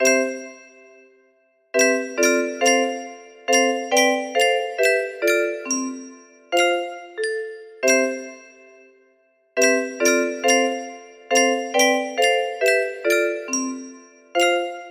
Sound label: hymn